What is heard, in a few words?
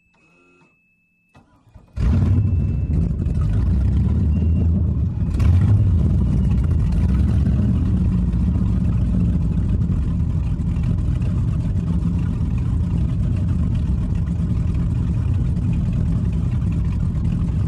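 Power Boat Interior Start Away, off shore power boat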